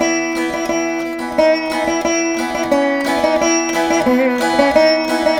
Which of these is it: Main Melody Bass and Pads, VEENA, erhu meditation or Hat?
VEENA